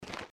step; Keep Out! A Dungeon Adventure for the Brave

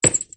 dodgeball; Looney Tunes - Recess

